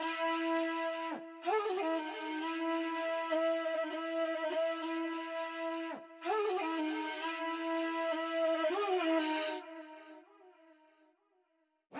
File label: Flute